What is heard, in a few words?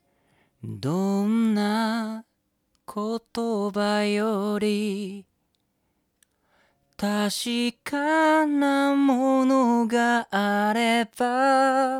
SHURE BETA57